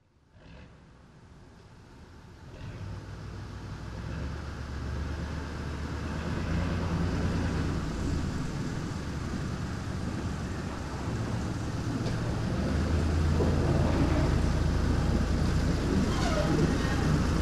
Locotracteur Plymouth; MZ-N710; CFHR

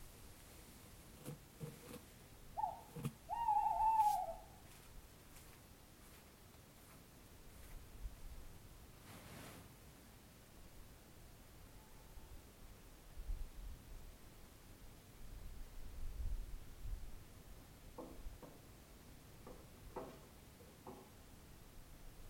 chouette

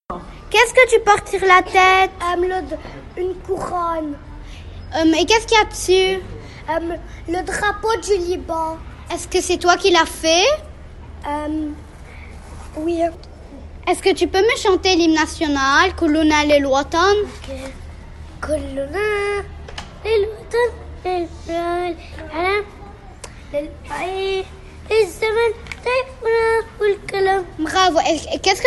web radio hymne nationale

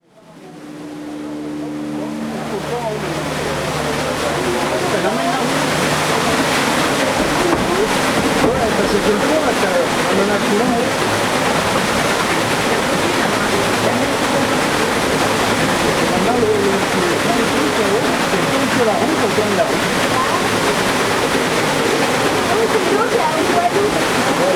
Moulin l Abergement de Varey

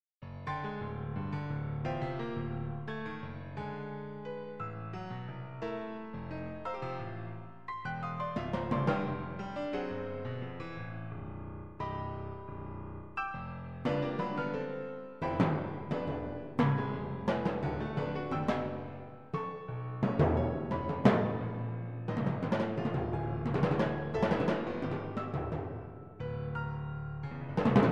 piano avec timbales

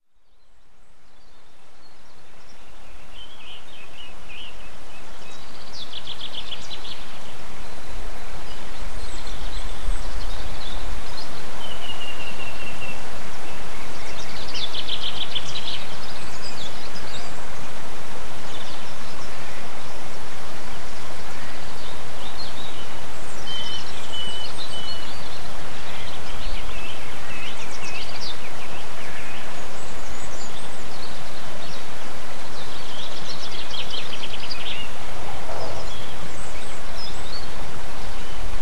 Chants d oiseaux ruisseau; MZ-N710

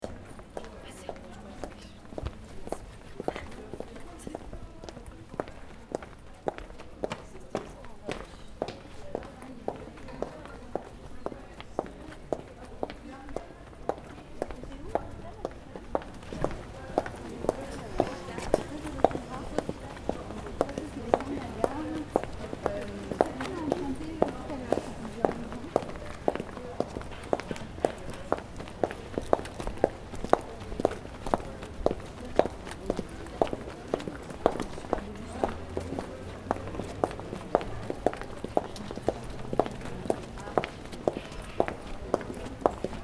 talons sol

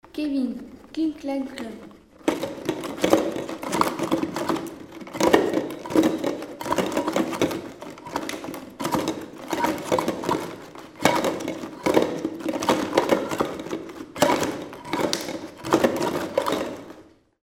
clic clac clon

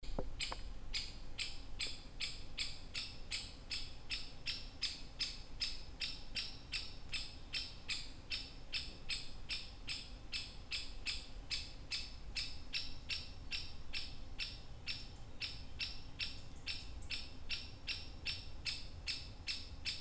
oiseau à identifier

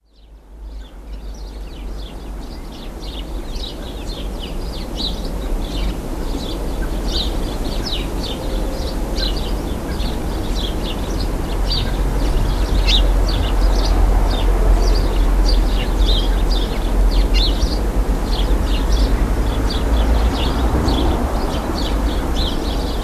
oiseaux dans la neige

